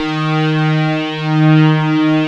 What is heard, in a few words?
P5 STRINGS; Greytsounds; Scott Peers Sound Engineering